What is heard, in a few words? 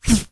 Weapons Bullet Fly By; liblast